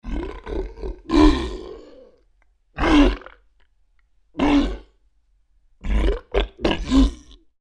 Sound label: Creature Large Grunts Pain; Monsters; Sound Effects:Horror; Big Room Sound